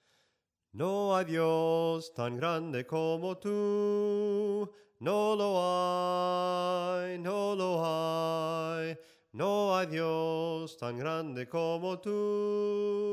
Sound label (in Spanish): SOPRANO CANTADO, himnos